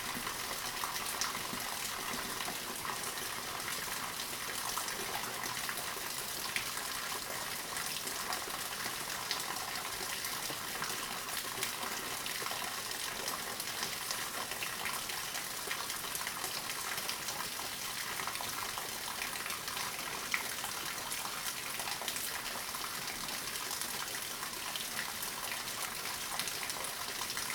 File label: rain, heavy rain with the gutter pouring it out; ambience; NS Studios - ultra sound collection